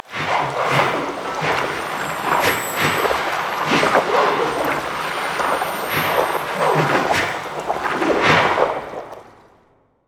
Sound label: emote dance; LoL; nami